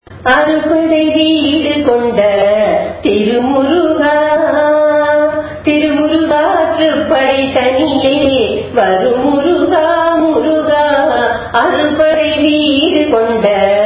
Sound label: raga kambhoji cine; carnatic music